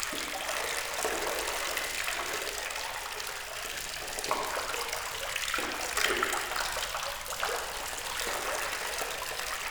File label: Cave Water Drip Loop